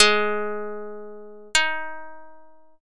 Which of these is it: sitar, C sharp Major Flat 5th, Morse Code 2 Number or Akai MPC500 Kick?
sitar